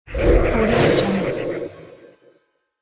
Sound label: magical spell sound